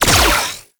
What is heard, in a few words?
Beam Shot Fire; Warframe; Corpus; Glaxion Beam Moa